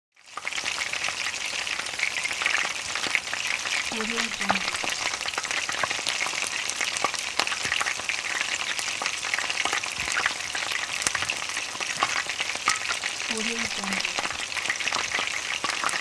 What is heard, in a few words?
frying food